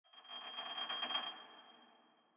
high shimmering metal sound (G)

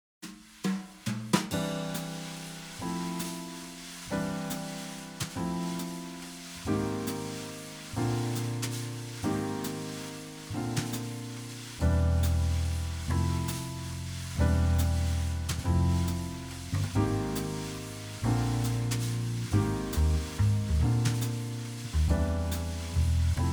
chords for sound-makers

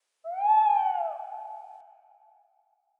owl hoot; owl hoot 2

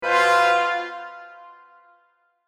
digital brass chord

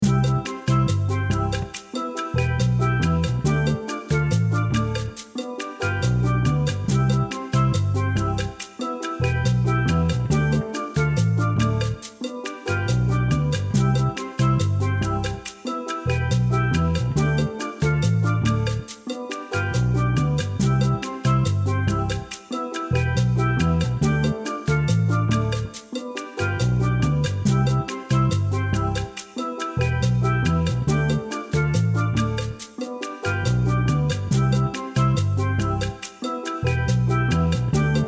four-bar ostinato; Calypso is a style of Caribbean music that originated in Trinidad and Tobago

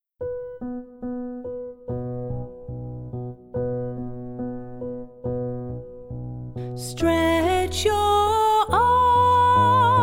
Stretch and bend; Music-makers

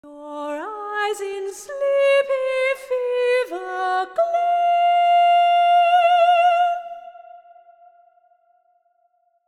Hush, Lullay, vocal phrase

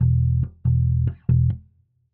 bass note E; Calypso

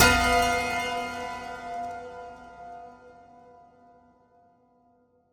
Hush, Lullay, harsh strike; Léonie Adams

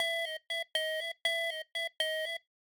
remote control; Robot boogie, Dada's remote control

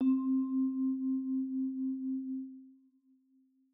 vibraphone note C

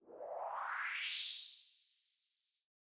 whoosh up

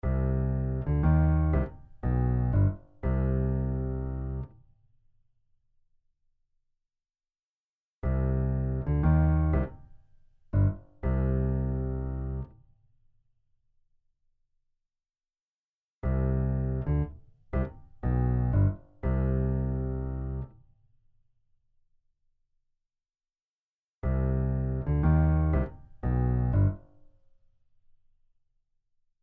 Melodic motif, notes omitted; Motif-makers

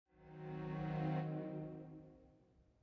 string chord on E flat

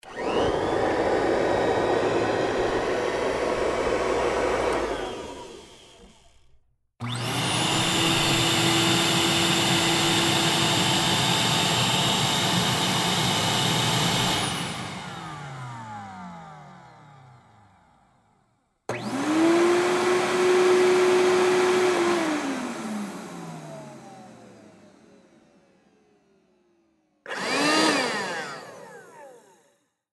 Vacuum cleaners

